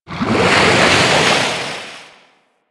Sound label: Shark Tail Move Slow; Warframe; Grineer Ocean Shark